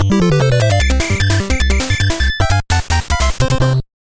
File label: Win Race, BGM; Super Mario Bros. Remastered